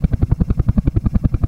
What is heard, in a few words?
engine